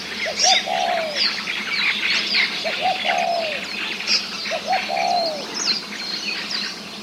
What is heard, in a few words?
Spotted Dove